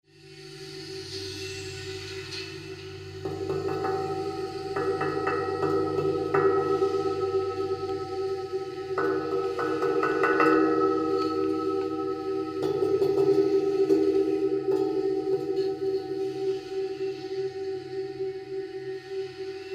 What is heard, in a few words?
bridge piezo nomass